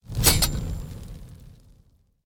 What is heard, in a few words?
Magical Enhance or Upgrade